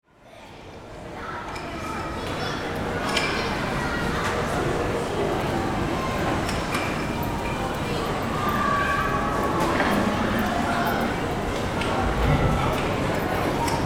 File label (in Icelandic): Smáralind